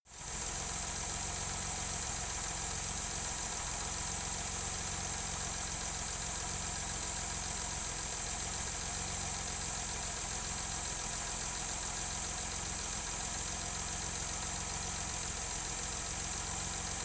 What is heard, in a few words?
car jaguar e-type idle engine